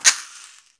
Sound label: caxixi; AKAI S-Series CD-ROM Sound Library VOL-5